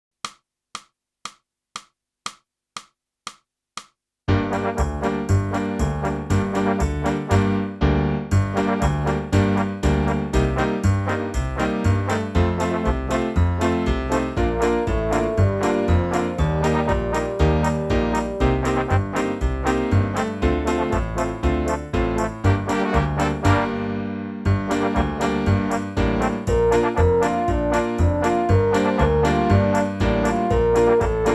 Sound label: clarinet; boosey method